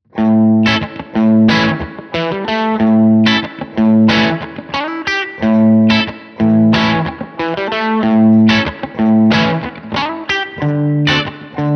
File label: characteristic sound; jensentone